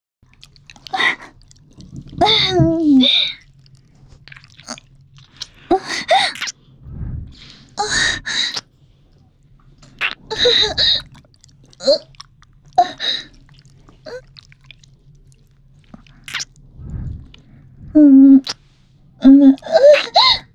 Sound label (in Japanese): 耳を舐めてあげる, ASMR